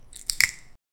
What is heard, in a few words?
snapping turtle hiss stro